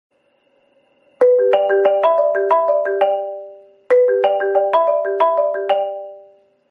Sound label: iPhone Marimba Classic